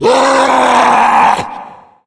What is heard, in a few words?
tank yell, voice yell; source engine; left4dead2